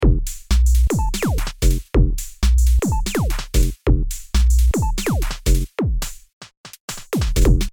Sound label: Music loop